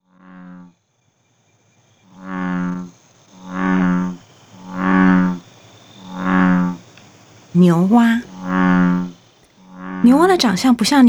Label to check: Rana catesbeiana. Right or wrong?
Right